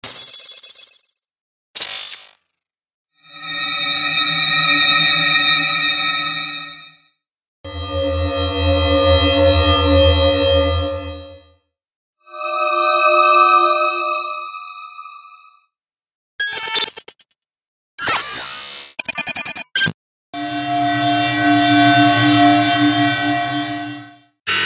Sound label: Glitches Hover Alerts